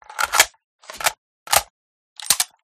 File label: scar reload, guns